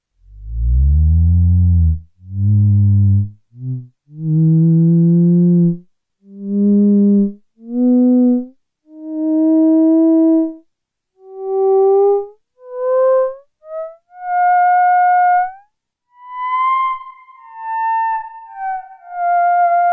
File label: theremin